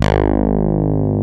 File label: BASS; SoundFactory